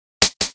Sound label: Spanking